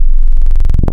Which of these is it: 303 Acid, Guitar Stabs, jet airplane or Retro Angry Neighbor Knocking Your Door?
303 Acid